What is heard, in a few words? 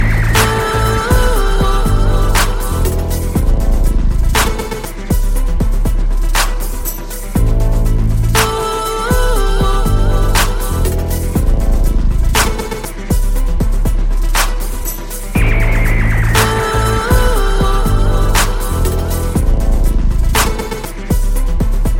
iphone xs max ringtone